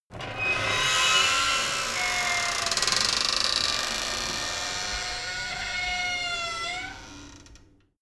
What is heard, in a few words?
metal door screak thriller close slow